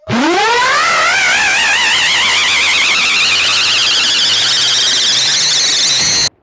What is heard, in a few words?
scream; roblox